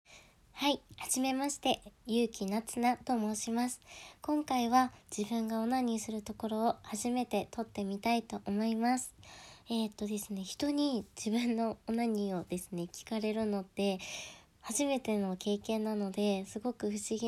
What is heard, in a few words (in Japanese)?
初 ガチ 実演 オナニー; THE FIRST ORGASM